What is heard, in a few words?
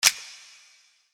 click